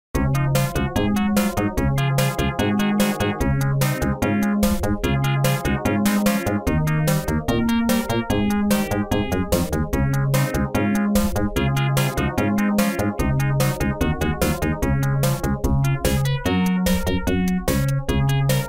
stage music; come back toto snow bros hack arcade original soundtrack

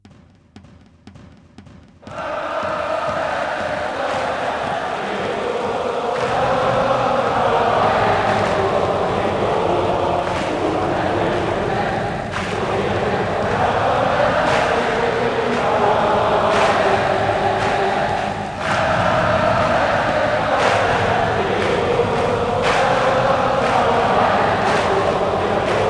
Chants Italy

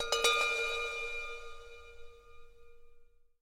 Temple, Indian Bell

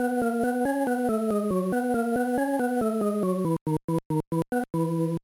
Jingle, gba; backyard baseball 2002

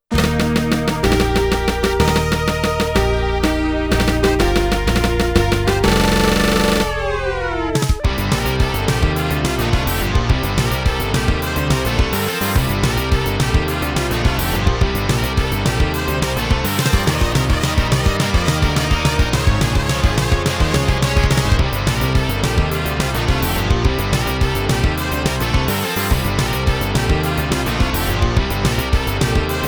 Boss 3 (SC-88); Hisyou

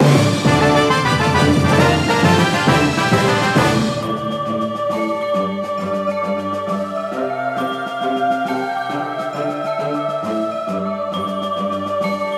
Leave Port Fanfare; Steel Diver

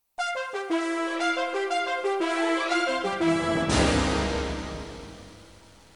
startup gingle, soundtrack; Tron 2.0

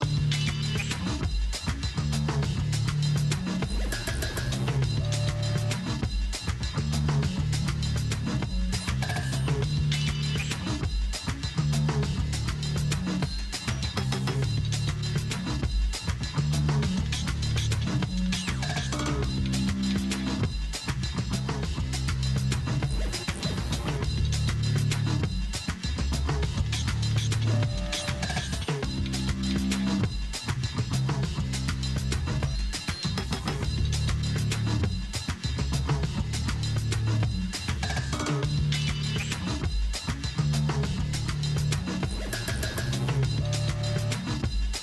Arcade Countdown; LittleBigPlanet PS Vita; interactive music

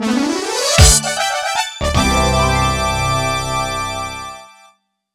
Competition Opening

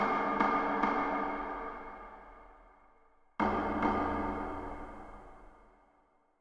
Daiko loop

